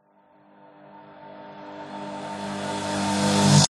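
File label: sound design cinematic reversed build tension medium crescendo; zapsplat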